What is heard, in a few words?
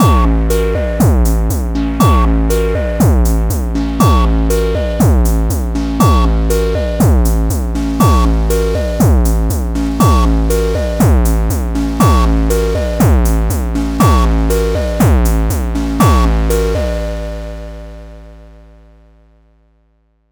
completely dry mix